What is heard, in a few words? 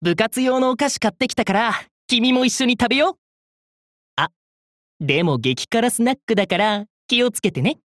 Pop Music Groovy